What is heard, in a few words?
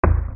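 percussion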